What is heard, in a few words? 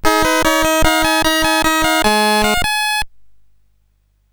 sid, CSG8580R5; Blueprint